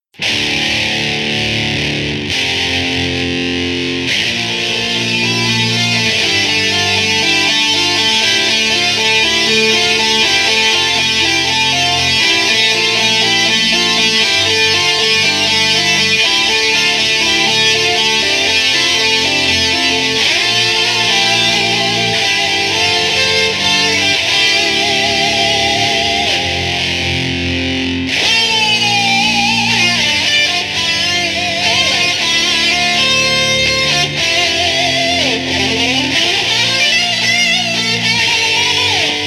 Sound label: Guitar Lead